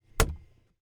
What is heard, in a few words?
chin sock metal